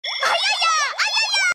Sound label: notification sound; ayaya